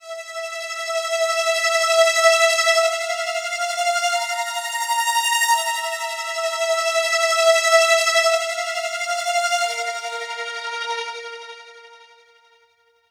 E Sinister string with tail, 175

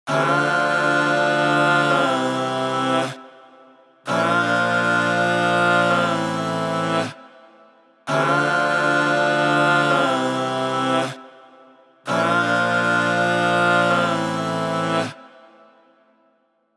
Chord Better As Just Friends 120bpm

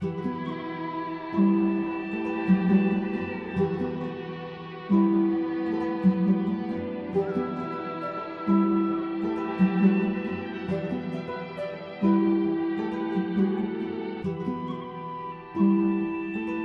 135 Em Latin Guitar; Na Dont Care

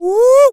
TAIKO vocal wu

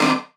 C Synth Chord Hit

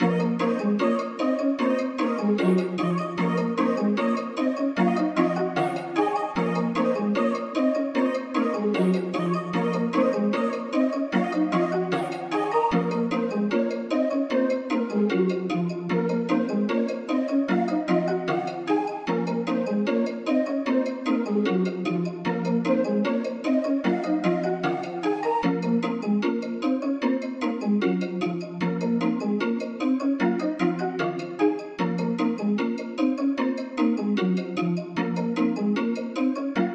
151bpm

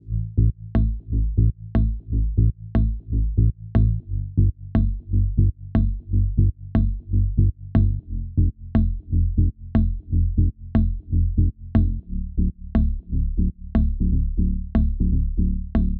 120bpm, Bass; Melodeep Kit 2

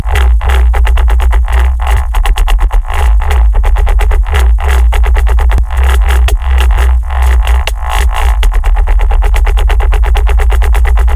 172 bassline loop saw Am

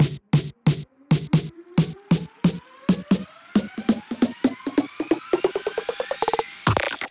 FX Snare Roll D- 135

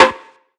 snare; vibe

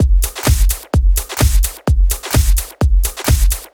128 Drums Full

